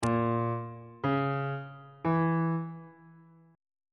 c flat melodic minor chord 7 is b flat diminished on bass clef